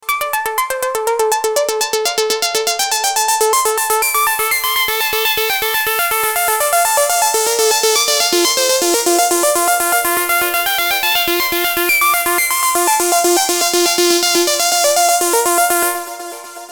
Arp Ladders 122BPM Dm, Melodic Indie Dancers; Unison Artist Series; Mac Depulse

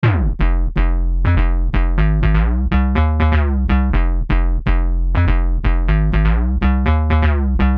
Bass Loop 123bpm Fm; Unison Artist Series; Tchami "Eternal Sounds" Vol.1

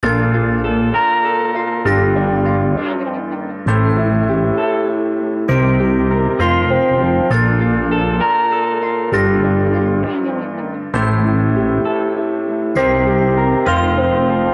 Melodic Loop 132 BPM F; Unison Beatmaker Blueprint

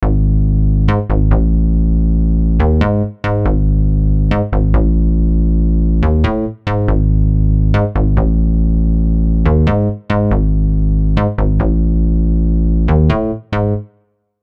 Bass; Controversy; Unison Ice'd Out One-Shot Collection (Omnisphere)

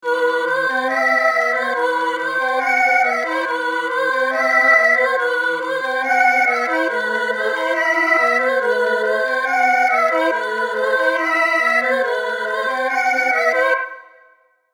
Lead, Omnisphere; Unison Omnisphere Starter Pack

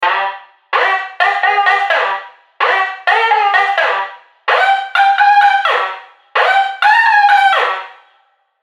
Lead; Baby Yaga; Unison Omnisphere Essentials

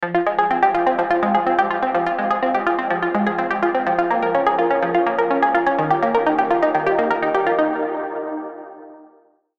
Keys, Techno; Moment; Unison Techno One-Shot Collection

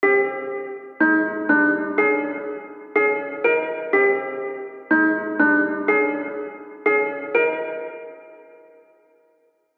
Broken Piano; Unison Artist Series Starter Pack

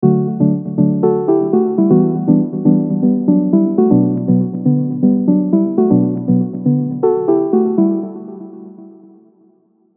120bpm, Aeolian; MIDI; Unison Aeolian Advanced Scales MIDI Collection

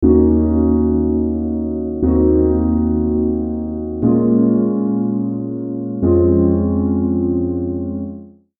Dorian Prog; Advanced Scales MIDI; Unison Advanced Scales MIDI Essentials

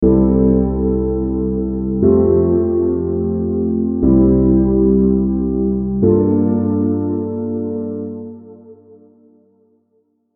Mixolydian Prog; Mixolydian Advanced Scales MIDI; Unison Mixolydian Advanced Scales MIDI Collection

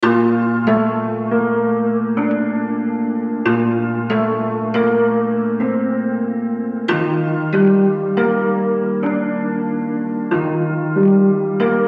Bb 70, Lo-Fi MIDI Melody; Linger; Unison Lo-Fi MIDI Melody Collection

